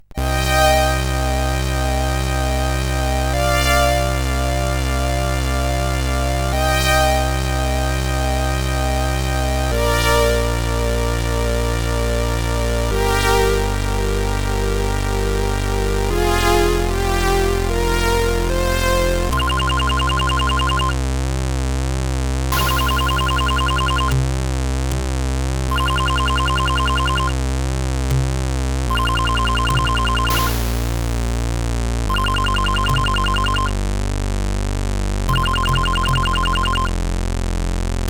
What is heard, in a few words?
Intro Tune, CSG8580R5